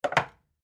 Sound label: Object Set On Table Picture Frame; Set Downs (Sound Effects:Objects); Foley; Blastwave